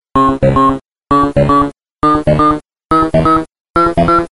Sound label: loop